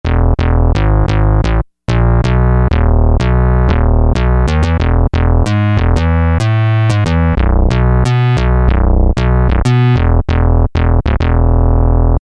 bas; bas-CZish; E-mu Orbit V2

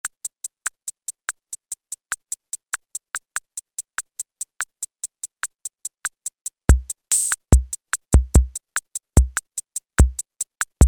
Sear78 Kit, Alesis Z2 EuroDance

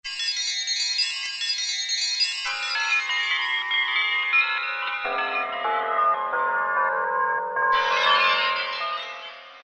Wind Bells, Korg M1; Factory preset examples

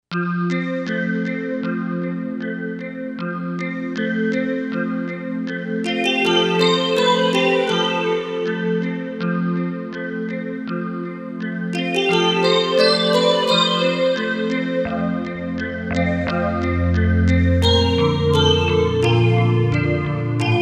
Wavesweep, Korg M1Rex; Factory Patches

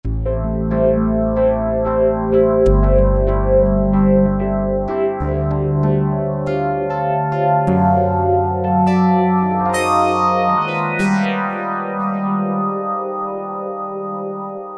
FM Klav, Roland V-Synth; Factory Patches

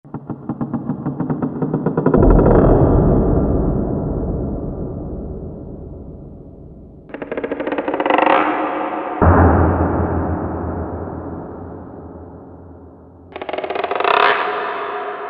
Open & Close

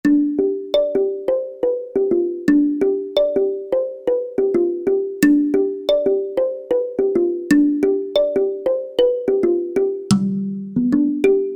Sanza

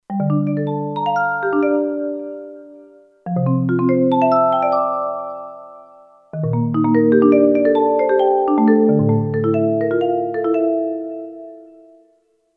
Multi Marimba, Kurzweil K2500X; Factory Programs

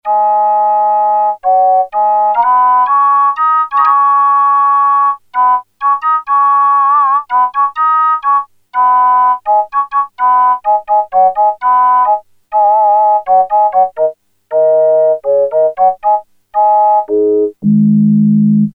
Flutes In Fifths, Prophet-5; Sequential Circuits Prophet-5 (Ver.3); Factory Patch; File 3 Programs

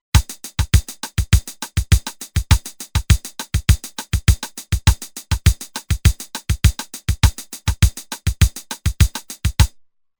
Rock Bossa, Roland TR-505; Factory Rhythms

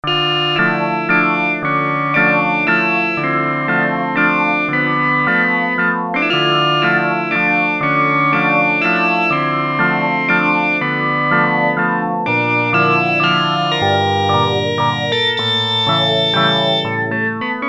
Dulcimer Organ, Prophet-5; Sequential Circuits Prophet-5 (Ver.3); Factory Patch; File 3 Programs

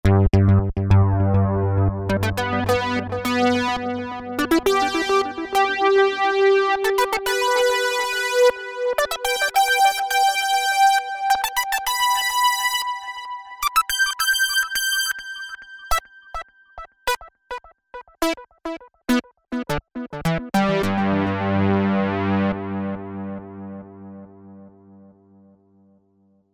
SYNTH LEADS; Solo Star; Apple GarageBand 2

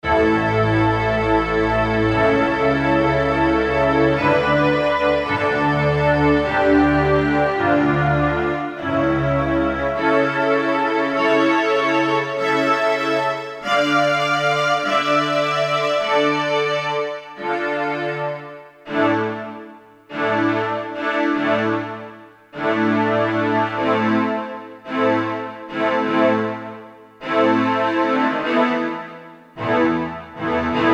STRINGS, Korg DSS-1

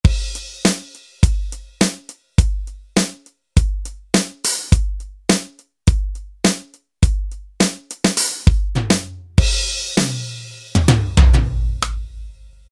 Dry Kit; Roland L-CDX-01 Rhythm Section Instruments

